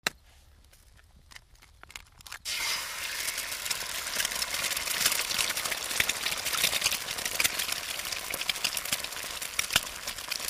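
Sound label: Eggs On Griddle Egg Crack, cooking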